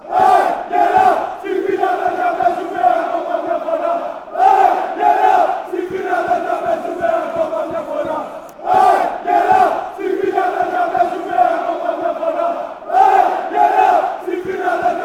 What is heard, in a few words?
chant; aek athens